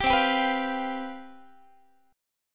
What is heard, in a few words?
C Major 7th Suspended 4th, down, Guitar; CM7sus4 strummed